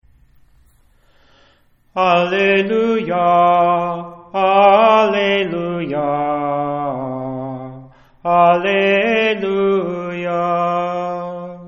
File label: Alleluia with Psalm 150, sacred chant; Holy Saturday